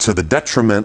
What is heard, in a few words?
Vocal Phrases; Vengeance Samples; Vocals